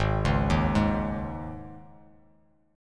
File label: G sharp Suspended 4th G sharp, Bass Standard; G#sus4; arpeggiated; JGuitar